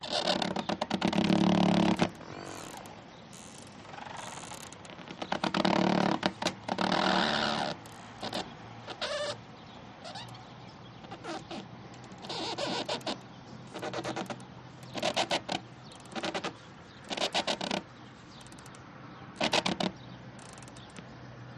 boat rub squeak against jetty movement waves distant traffic